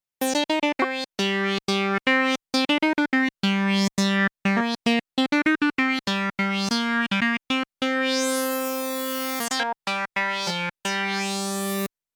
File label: saw lead